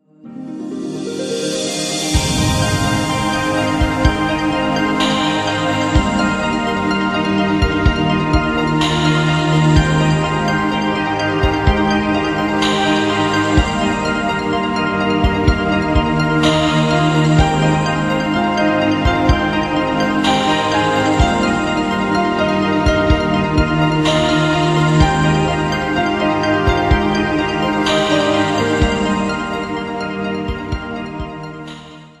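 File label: melodic music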